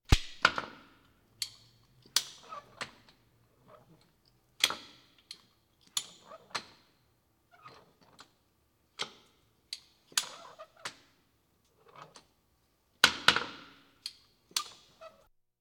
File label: Police, Fire engines